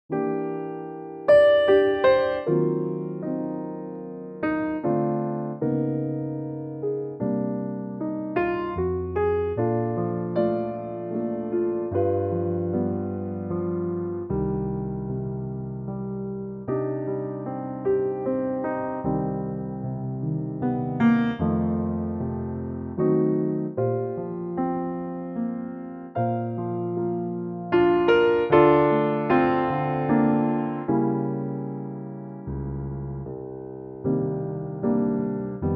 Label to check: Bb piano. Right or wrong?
Right